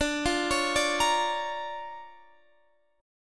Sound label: D Minor Major 7th Sharp 5th D, guitar; arpeggiated; x,17,15,18,15,18